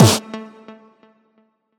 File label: combo power; quatuor